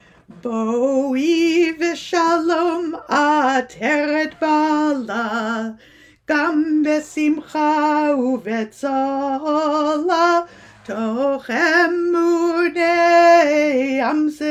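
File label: Lecha Dodi verse, Group Songs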